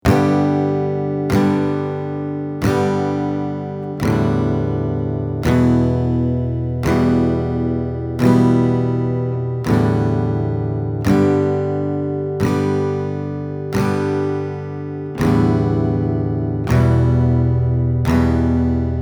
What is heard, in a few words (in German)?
Akkorde wechseln Viertel slow